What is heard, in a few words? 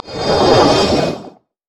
surging tides; LoL; nami